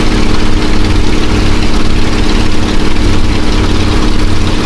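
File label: griffon cockpit right, engines; War Thunder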